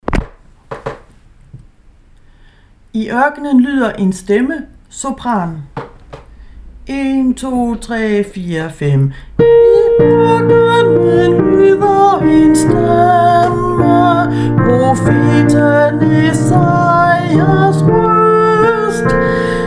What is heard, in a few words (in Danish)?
sopran; i ørkenen